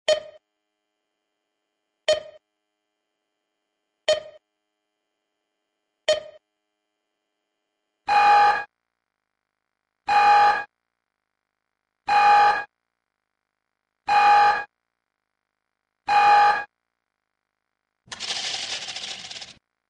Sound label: swan alarm ringtone